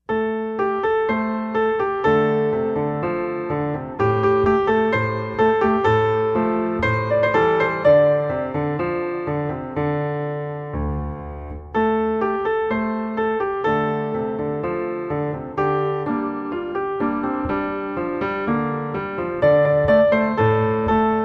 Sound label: music only; childrens songbook; Chinese